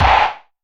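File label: TR909 Snare, Roland SH-32 Drumset; Drumset 04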